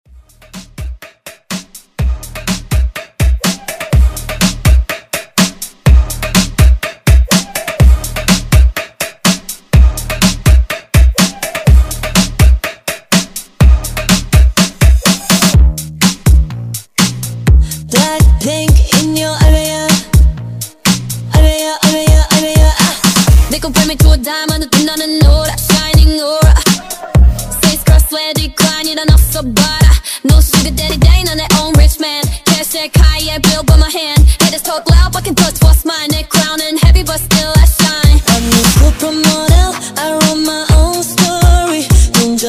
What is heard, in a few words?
Kpop Redrum Intro